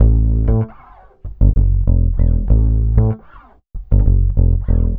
Vintage Fretless, Electric Bass; Sonic Foundry (Sony Creative Software); Leo Cavallo Bass Taster

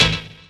DR. LOOP; X-Static Goldmine 3; CHORDS

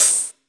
Open HH, Cymbals; Vengeance; Essential Clubsounds Vol.4; Open Hihats

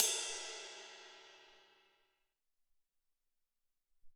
Ride, Alesis SR-18

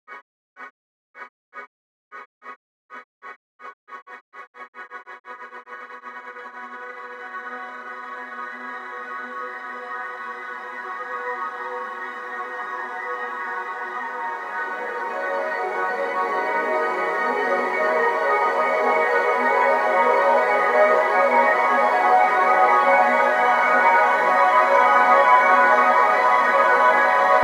pads riser

